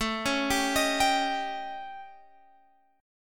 A 7th Flat 5th Guitar Open G; 19,18,17,20,20,x

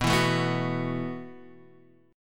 B 7th Suspended 4th B down Guitar Standard; B7sus4 strummed; JGuitar

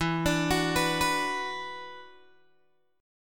E 7th Suspended 2nd, down, Guitar, Open G

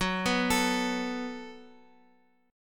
F sharp Minor Double Flat 5th, Guitar, Open F; arpeggiated; down

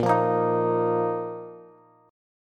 Bb Suspended 4th Bb down Bass; Bbsus4 strummed

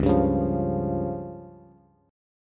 Db Major Flat 5th, Bass; strummed; JGuitar

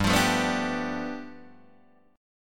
G 9th, Guitar Open D

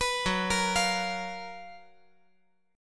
Gb Major 11th

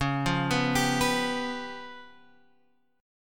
C sharp 7th Flat 5th C sharp down Guitar Open G

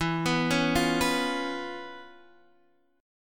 C sharp 7th E; C#7/E; arpeggiated

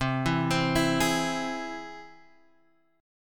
C Augmented, Guitar Drop D, chord

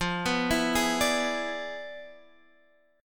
E 7th F, guitar; E7/F arpeggiated; JGuitar

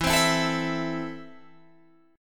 E Suspended 4th Sharp 5th, Guitar Open G; 14,17,14,14,17,14

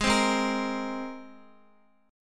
Ab Major Add 9th, Guitar; strummed; x,x,8,5,8,6; down; 4F,4A,5C,5G,5C,6E

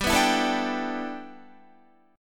G 6th Add 9th G Guitar, chord; G6add9 strummed

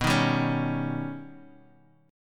D sharp 7th B, guitar; D#7/B; strummed

